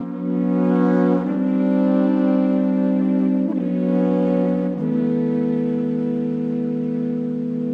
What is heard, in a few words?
Sampler 124bpm